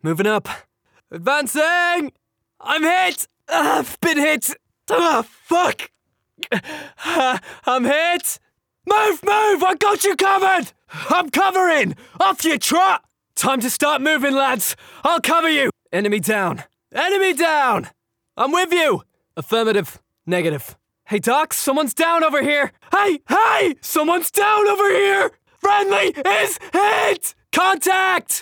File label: Soldier efforts